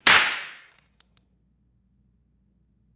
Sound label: empty shotgun shell dropping and rolling; bullets